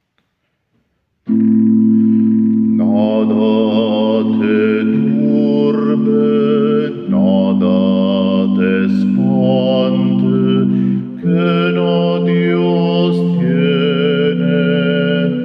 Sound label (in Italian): Nada te turbe bassi